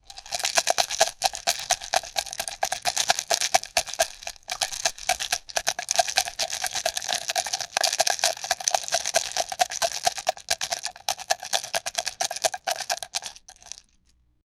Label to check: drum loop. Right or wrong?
Wrong